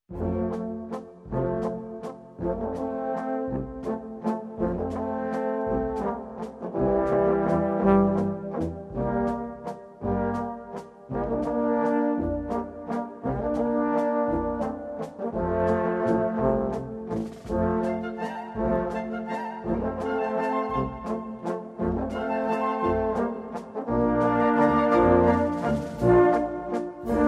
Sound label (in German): walzer